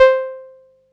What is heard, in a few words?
505, String Machines